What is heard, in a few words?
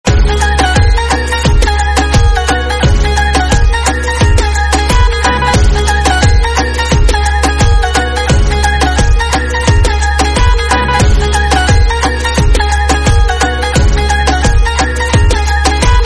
Instrumental